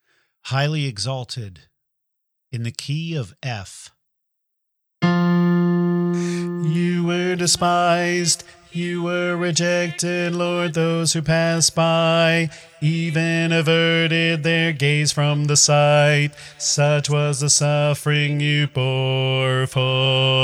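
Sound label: Highly Exalted Bass, choir part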